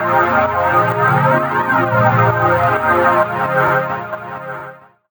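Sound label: Pad Synth; Equipped Music; Loungin House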